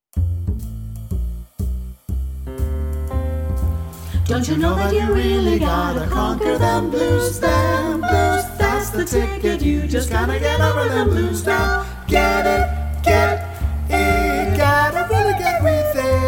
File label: Vocal Blues Etude NO SOPRANO